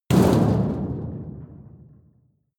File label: metal impact sheet rumble rattle hard; hits explosionen; metall